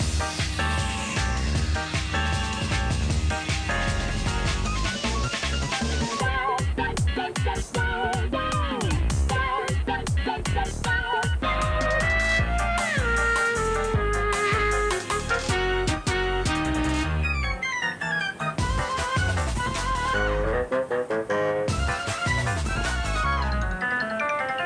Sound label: Phase1 Snow